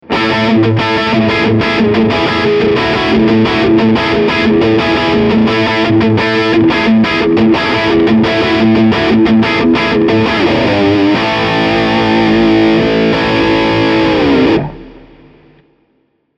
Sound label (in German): Engl Fireball 25 Lead Channel 12 Uhr Mid Boost on